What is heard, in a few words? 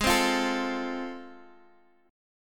A Minor Major 7th Sharp 5th G sharp